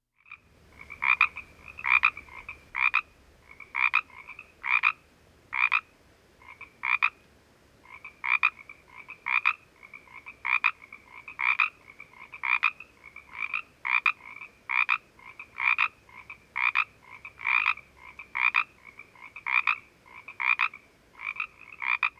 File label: Pseudacris regilla